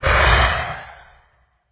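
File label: skeleton attack up mid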